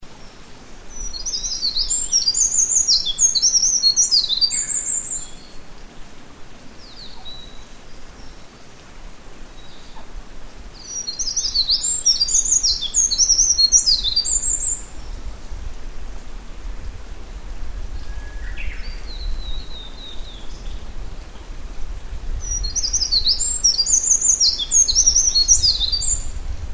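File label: misosazai, wren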